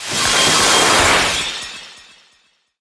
Prostnova Distunce Cast; Knight Online

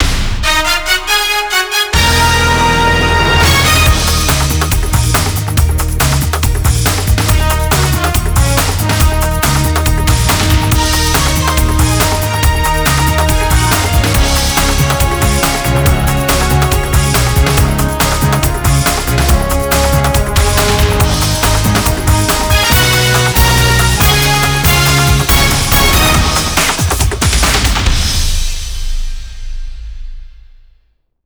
Broadcast Song; NBA ShootOut 2004; ps1; ps2

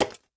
Obstacle Wooden stick; In Obstacle Woodenstick

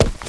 Footstep Run; Starbites

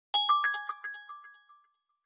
jingle - Medal Bronze; stardrone; PS3

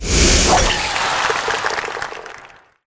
Music Frontend

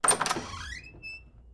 Ui Window

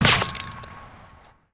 Water Splasher; Rabbids Multiverse

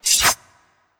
Impaler Earth Ready

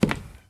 Wood footstep

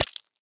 Insects Bug Hit Windshield; Frogsong

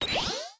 move voice; spiderboss; enemies

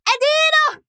Fight Yell

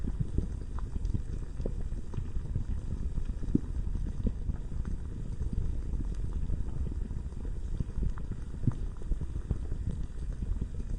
Forgesound

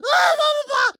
Water Splasher

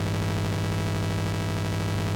Battery Indicator-Machine Broken-Emf Loop

